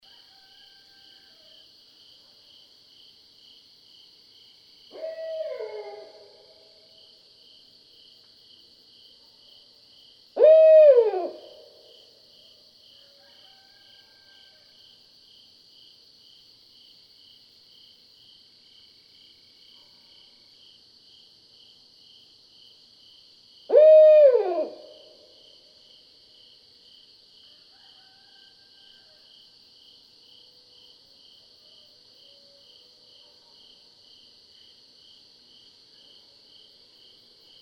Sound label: Barred Owl